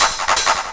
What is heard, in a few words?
PERC, PERC LOOP, Disco House; AKAI; USB Soundscan vol.20 - Fresh Disco House I